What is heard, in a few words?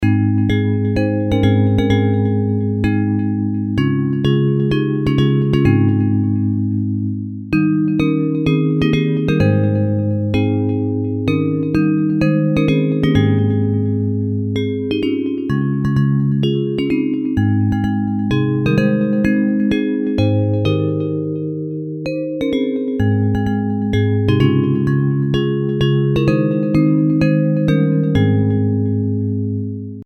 Bells